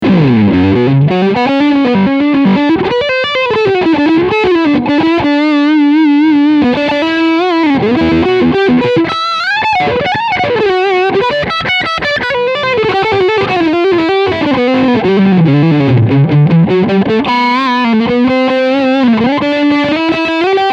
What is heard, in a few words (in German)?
Lead StegMitte